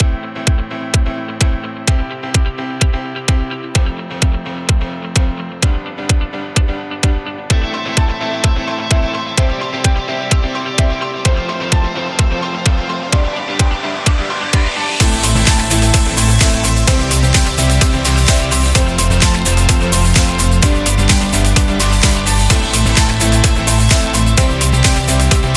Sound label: Pop Rock